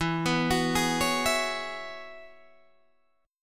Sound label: E 6th Add 9th E down Guitar Open D